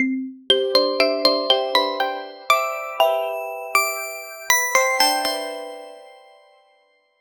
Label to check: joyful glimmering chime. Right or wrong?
Right